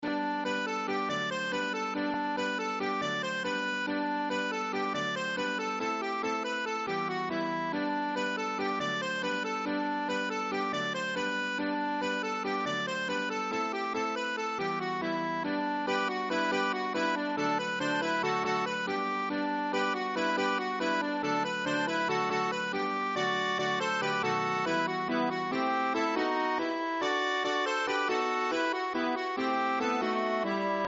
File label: Fatise Kolo, balkan